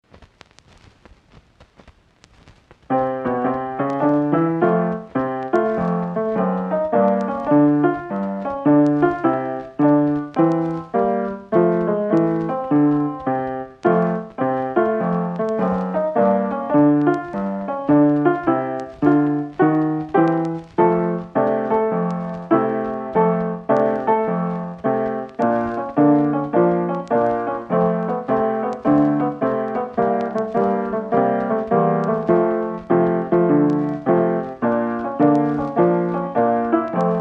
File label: Retro